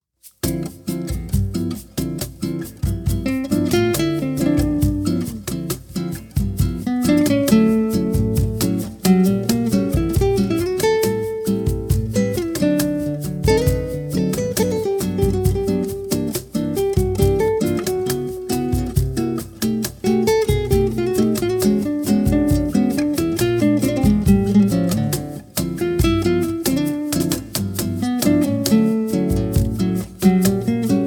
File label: w perc